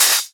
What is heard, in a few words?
Open Hihats, Cymbals